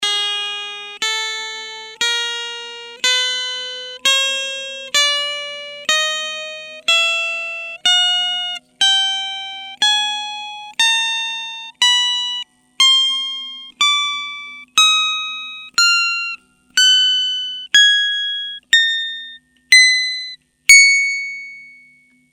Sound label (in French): Echelle 22 tons corde Meteor